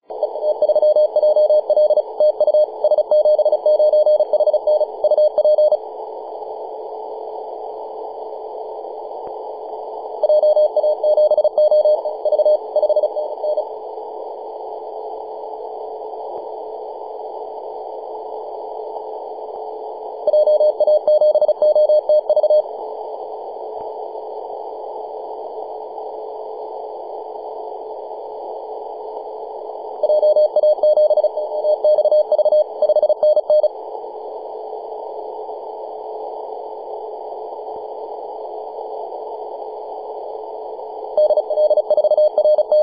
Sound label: 24 910mhz cw